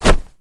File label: tug; adron